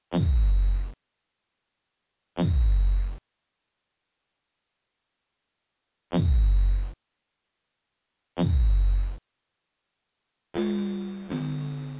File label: ambient